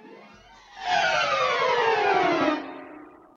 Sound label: fall down music; sfx